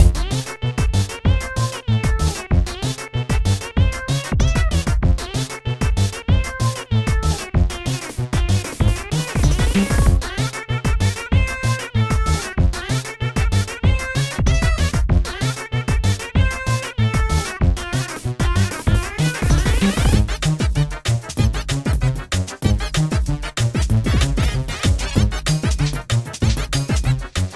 mega hammer fast; SMG2

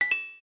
Casino Coin Get; SM64DS

